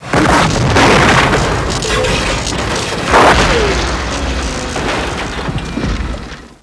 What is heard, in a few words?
Death